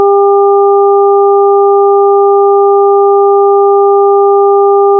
wave 400Hz phase shifted, ③の波形の2倍音を30°、3倍音を60°遅延させた定常音